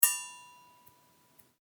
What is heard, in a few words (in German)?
Metallklinge